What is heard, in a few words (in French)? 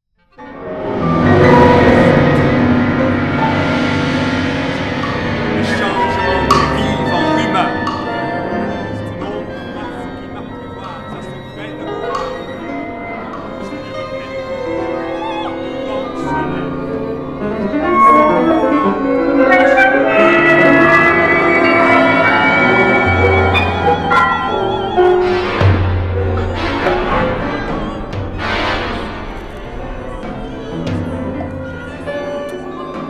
Oeuvre arpege